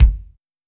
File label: 00s tight kick single shot g key